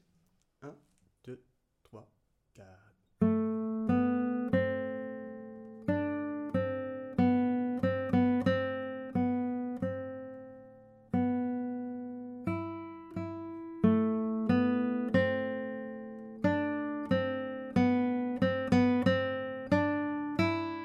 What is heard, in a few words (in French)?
Boucle Bouclee Guitare Vite